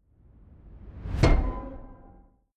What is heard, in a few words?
metal weird flute